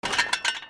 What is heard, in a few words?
HOUSE